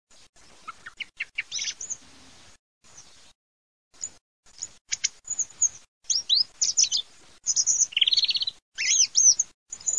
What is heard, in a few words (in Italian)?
TORDO